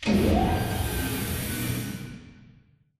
radar tower servo long; Titanfall 2

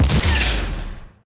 atlas eject propulsion; Titanfall 2

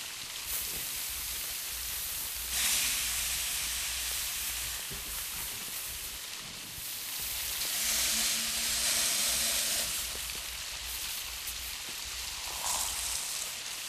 backwater rice fall; Titanfall 2

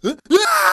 spectre curb stomp grunt; Titanfall 2

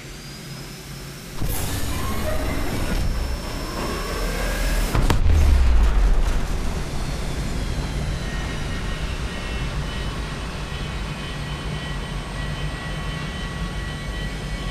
evac warp fly out; crow; Titanfall 2

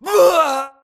grunt death crush; Titanfall 2

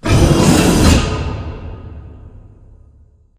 cooling panel rise; Titanfall 2

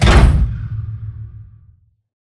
pod door ext verb close stop; Titanfall 2

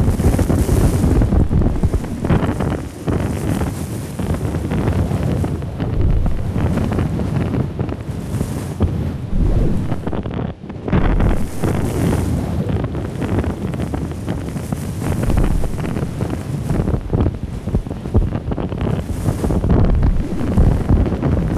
wind buffet; beacon; Titanfall 2

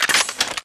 wpn arl reload; Titanfall 2

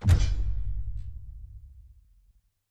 spectre power up light on; colony; Titanfall 2

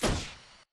boost hover start; Titanfall 2

